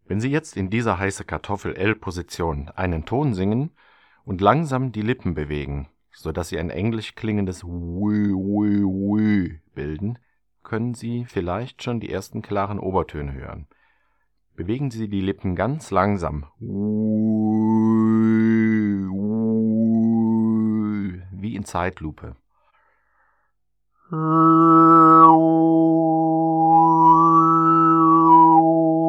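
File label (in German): L Obertöne mit WÜ; Buch Oberton Singen CD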